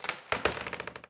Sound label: Tree Creak Hit